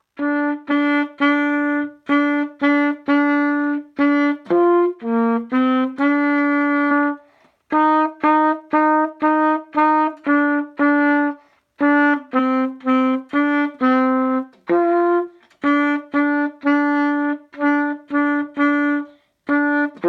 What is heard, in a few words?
Sax; Jingle Bells